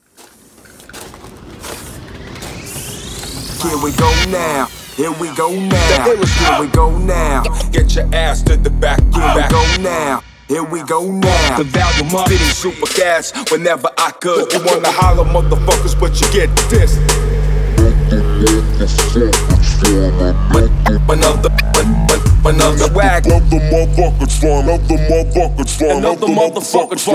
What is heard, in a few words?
Battle Rap Vocals; Black Octopus Sound